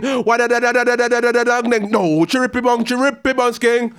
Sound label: ragga in B phrase